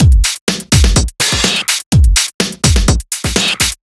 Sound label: Breakzone, Break Beat